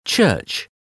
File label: church